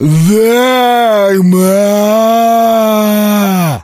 zombie attack

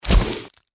deathclaw foot run, npc

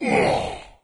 pain; doom3; commando

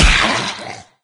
pain; doom3

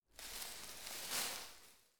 small animal scurrying; soundscapes

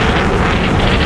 rocket idle

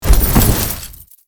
body hauberk rolling

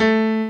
piano

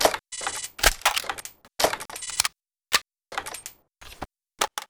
amat turret cycle

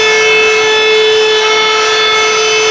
turret move, tank

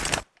clip in; tfa cso; mg42

